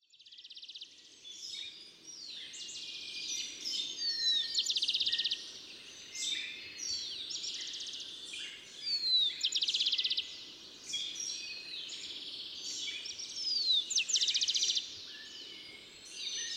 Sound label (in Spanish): grabacion de paisaje sonoro; Irazú; historia natural